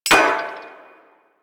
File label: Flame Spear Mech; Grineer